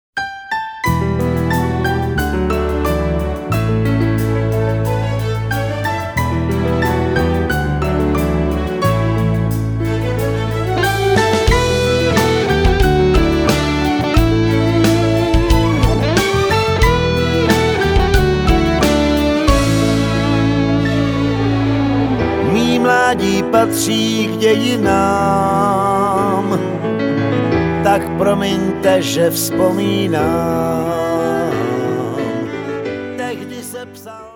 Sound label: big beat